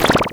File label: Garble, MultiMoog